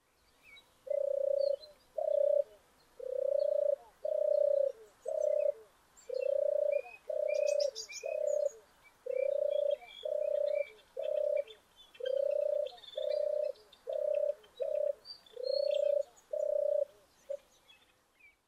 turtle dove

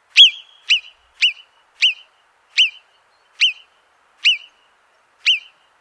marmot

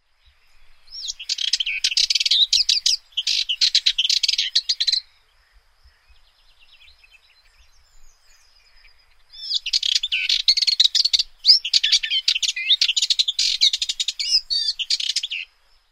sardinian warbler

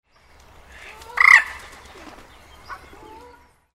moorhen

